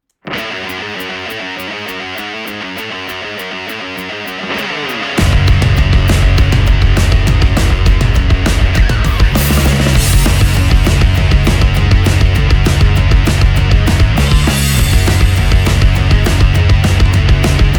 Riffs For Days

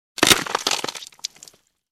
crack, Medieval Weapons Sound Effects

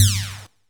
laser Large, futuristic weapons sound effects

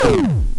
Explosion, Retro Game Weapons Sound Effects

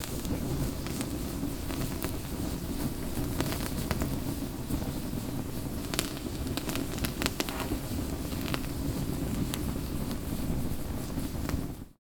Fire Staff, Fire sound effects

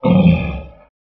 creature roar

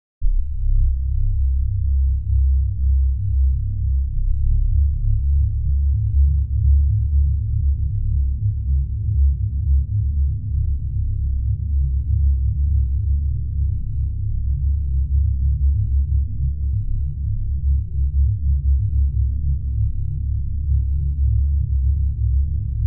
Very low note; dramatic

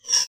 sfx, futuristic weapons sound effects

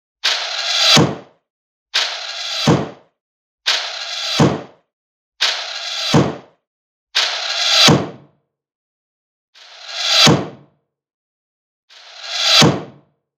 hollywood arrows; remixes of craigsmith

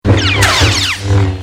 lightsaber clash

